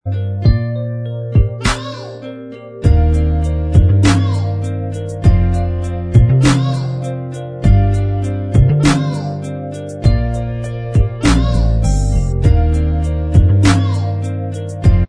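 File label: instrumental, backing tracks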